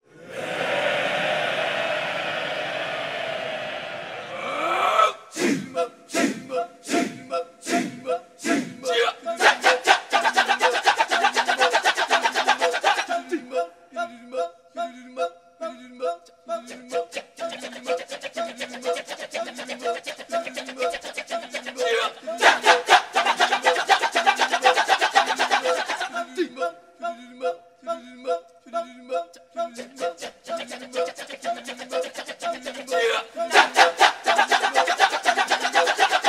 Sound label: Balinese Kecak